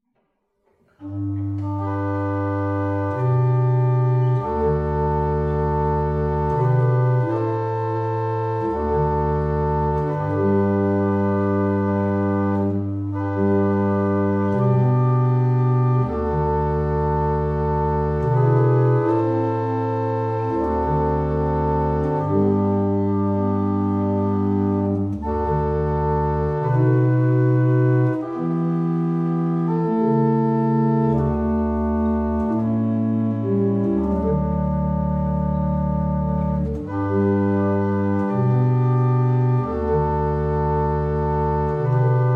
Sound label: German Hymn, barrel organ; Staunton